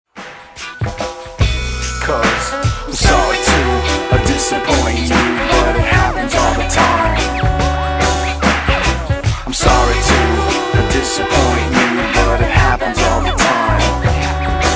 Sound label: Monkey Hate Reggae